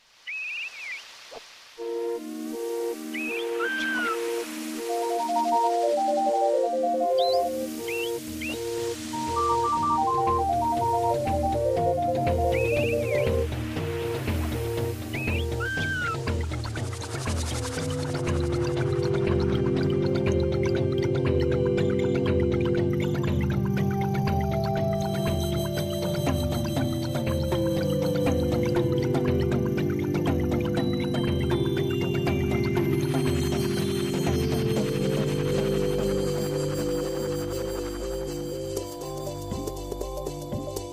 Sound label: msn connecting; Ringtones; wtv